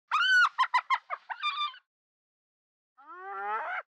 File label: animals hyena